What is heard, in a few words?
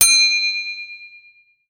Bell, Metal